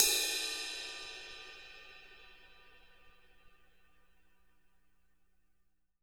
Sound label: crash tip